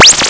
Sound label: test pattern